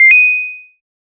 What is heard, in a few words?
item pickup sound inspire, lofi